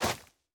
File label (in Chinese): 下界疣踩踏声